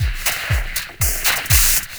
120 Dance E-Perc; Percussion; Pro Tools Essential Loops; Digidesign

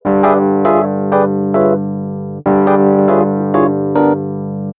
100 Acid Jazz MarkII Gmaj; Piano; E-Piano; Pro Tools Essential Loops; Digidesign

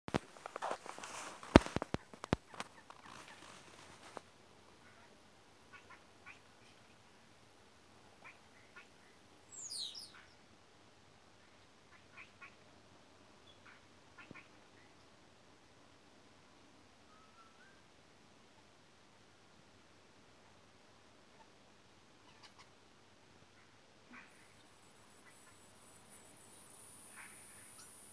bird song

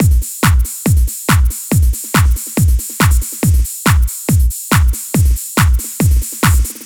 140 House Beat; Pro Tools Essential Loops; Dance DJ; Drums; House Beats

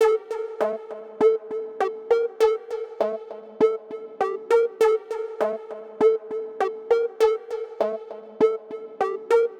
HipHop Synth Dmin; Digidesign; Pro Tools Essential Loops

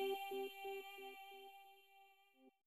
BG Vox; Pro Tools Essential Demos; Digidesign

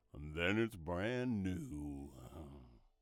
Vox

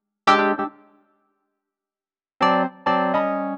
125 NuJazz Wurlitzer Emaj; E-Piano; Pro Tools Essential Loops; Digidesign

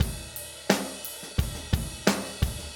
087 Steady Grooves, Drums; Pop Rock; Moderate Tempo; Pro Tools Essential Loops; Digidesign

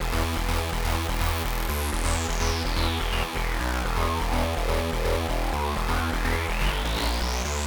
Dance Pulsing Synth Emin; Dance DJ; Digidesign; Pro Tools Essential Loops

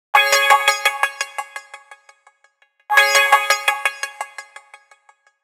HipHop Synth F#Min; Digidesign; Pro Tools Essential Loops

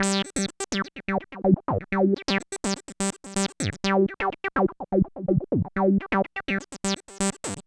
125 Dance Pulsing Synth Gmaj; Dance DJ; Digidesign; Pro Tools Essential Loops

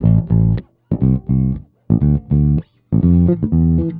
120 Pop 70s Bass Amin, E-Bass; Pop Rock; Pro Tools Essential Loops; Digidesign

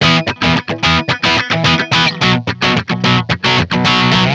110 Pop Guitar Crunch Rhythm E maj, Electric; Pop Rock; Electric 100-160 bpm; Pro Tools Essential Loops; Digidesign

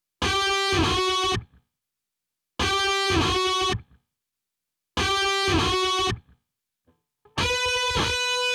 101 Indierock Guitar Dmin; Guitar B; Pro Tools Essential Demos; Essential Loop Demos; Demo Session - Rock Hard; Digidesign

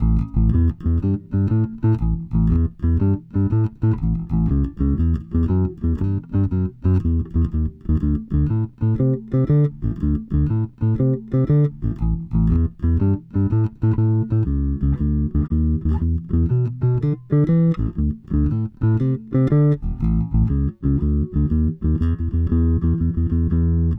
Bass B Sect, Blues; Digidesign; Pro Tools; Pro Tools Essential Demos; Essential Loop Demos; Demo Session

